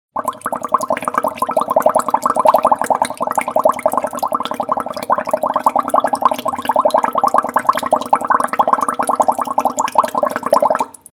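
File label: sound of bubbling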